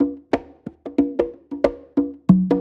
92bpm, Perc, Conga Loop, Slow, Dry

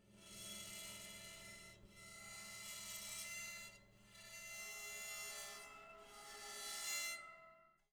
Zither Random Bows

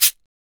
Percussion Shaker Shot

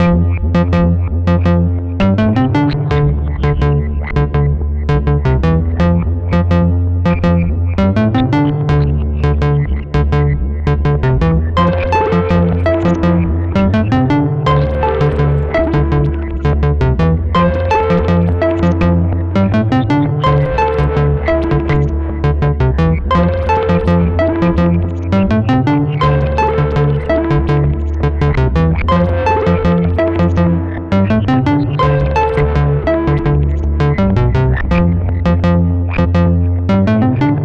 video game

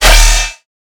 bass D-; Flick

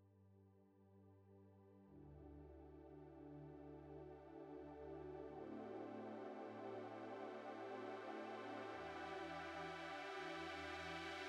Pad Dry

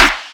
SNARE

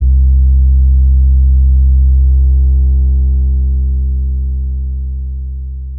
808 Lead; Kryptic Samples

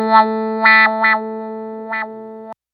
Clav A oneshot wah 95bpm

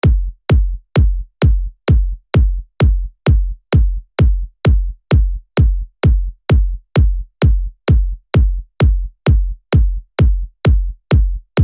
KICK LOOP

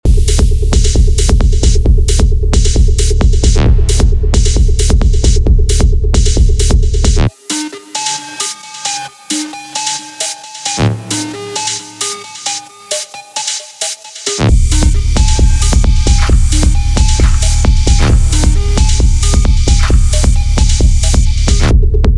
Dark Techno

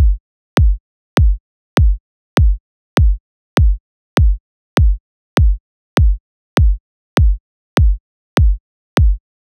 Kick

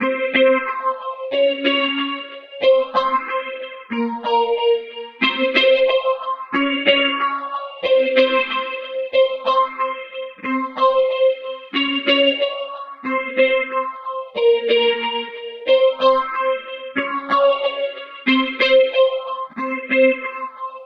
92 electric guitar riff phaser Cmin

